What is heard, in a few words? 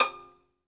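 solo violin, strings; ILIO; Synclavier Strings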